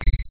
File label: wand, weapons